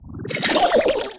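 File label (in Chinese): Witch skele dep, 部署音效 召唤小骷髅触发的音效; 女巫; 绿野世界; 部落冲突; 皇室战争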